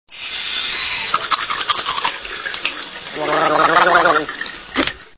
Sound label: Brush Teeth, Gargle, Spit; household